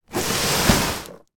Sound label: household fabric storage box slide across carpet floor